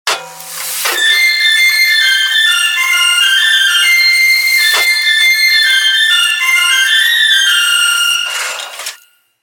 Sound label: Songbird Tune